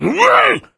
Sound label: zombie pain